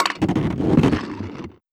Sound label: scrape, Cartoon Film Effects